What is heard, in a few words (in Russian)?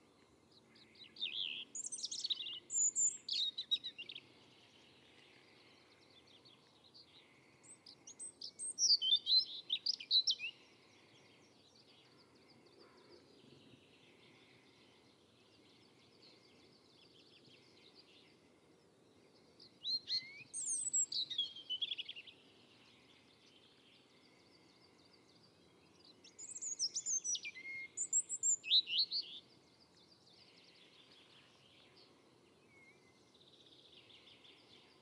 zaryanka erithacus rubecula, zvuki peniya ptits